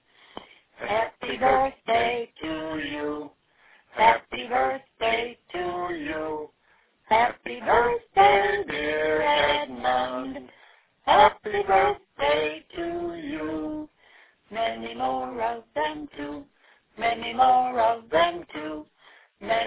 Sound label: mom and dad singing